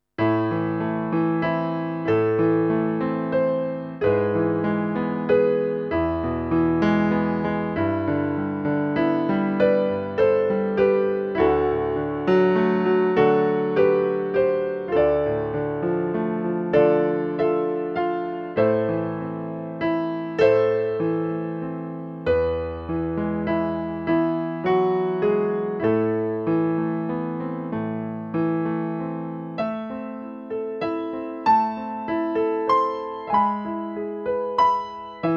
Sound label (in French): harpe, piano; saint seiya